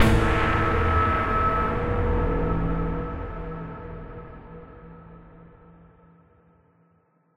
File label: Short Sting; Solus Project